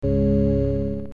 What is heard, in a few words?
Warp Engine boot